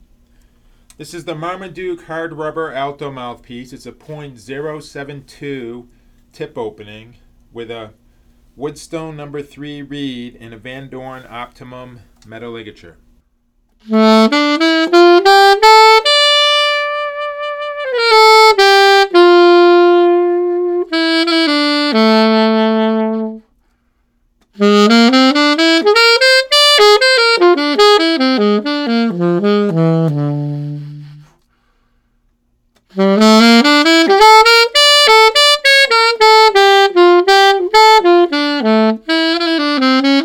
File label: Marm Alto